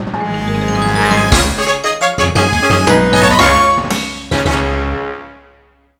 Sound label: BGM Jingle Get Grand Seed; mario wonder